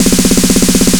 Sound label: Snare, Trance; Sonic Foundry (Sony Creative Software); Trance NRG VOL-1; Drums